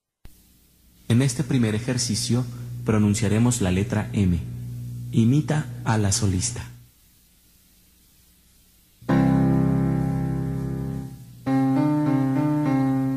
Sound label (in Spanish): Ejercicios con mmmm